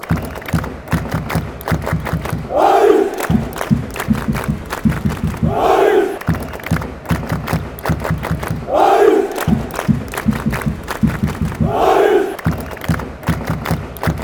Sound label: drum